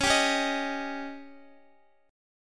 Db Suspended 2nd Flat 5th, Guitar; strummed; JGuitar

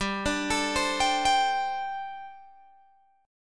G Suspended 2nd Suspended 4th G, Guitar, Open G

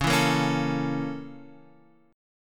Bb Minor Major 7th Flat 5th

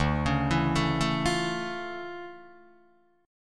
D Minor Major 9th D down Guitar Open D

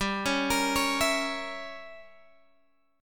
G Diminished 7th, Guitar, Open F; Gdim7 arpeggiated

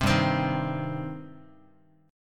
G Suspended 4th Sharp 5th G, down, Guitar; Gsus4#5 strummed; JGuitar

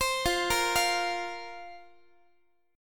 F Suspended 4th F, Guitar; Fsus4; arpeggiated; x,x,15,15,14,16

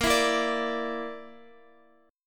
A sharp Major Flat 5th, Guitar; A#Mb5; strummed; x,x,10,9,10,10

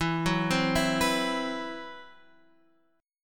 C Major 7th Flat 5th E; CM7b5/E arpeggiated

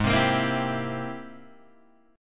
Ab Augmented Major 9th Ab Guitar Open G; strummed; 6,5,8,5,5,5; 4D,4G,5D,5G,5B,6D; JGuitar

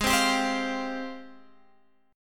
G sharp Major 13th, Guitar, Drop D; 18,15,17,17,18,x

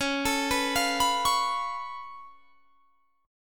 Db Augmented 7th Db down; Db+7; arpeggiated

